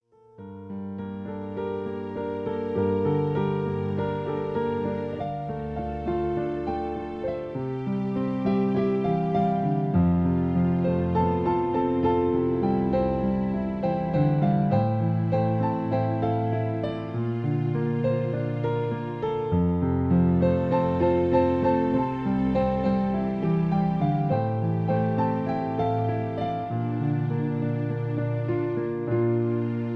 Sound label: My Piano Version